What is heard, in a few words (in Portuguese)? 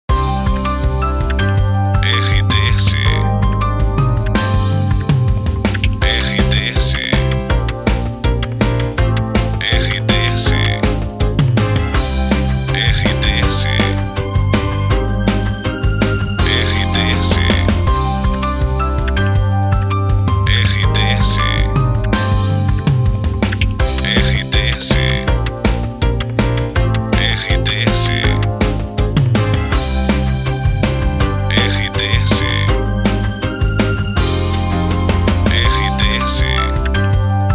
trilha para locução; Prata FM 2021